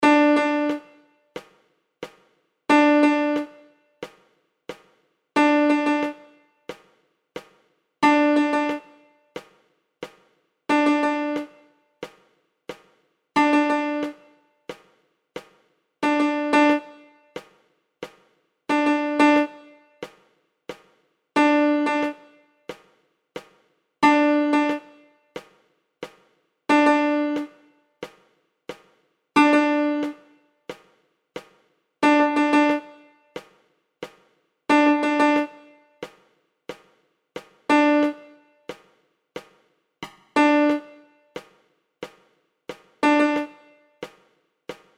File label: Rhythms